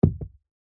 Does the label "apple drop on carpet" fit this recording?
Yes